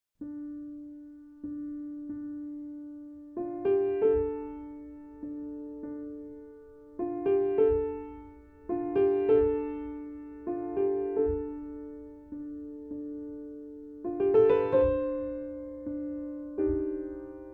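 FIKRAT AMIROV - PIANO, نت آهنگ رقص آذری برای پیانو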